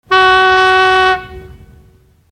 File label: dj horn